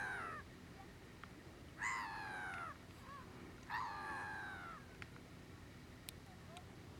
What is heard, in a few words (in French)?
mouette enervee